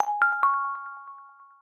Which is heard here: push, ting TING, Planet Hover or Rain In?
push